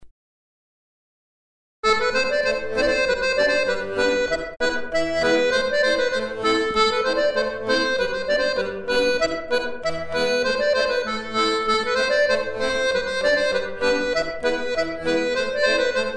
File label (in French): scottish de retz